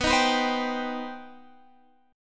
C Minor Major 7th B

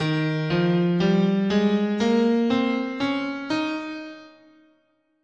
D sharp Mixolydian, guitar, scale

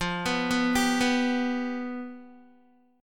F Suspended 2nd Flat 5th, Guitar; Fsus2b5; arpeggiated; 12,14,11,12,11,x

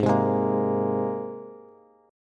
Ab Major Add 9th Ab Bass; Abadd9 strummed; JGuitar

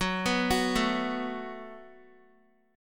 F sharp Minor Double Flat 5th, Guitar; F#mbb5 arpeggiated; JGuitar

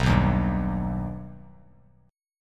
B Augmented B Bass Standard, chord; strummed; JGuitar

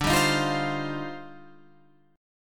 Eb Major 13th D, guitar

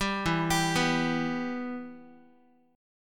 E-Minor, Guitar; arpeggiated; JGuitar

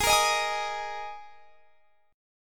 G sharp Suspended 2nd, G sharp, down; strummed

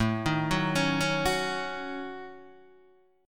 A 6th Flat 5th, Guitar, DADGAD; 7,6,4,6,4,4

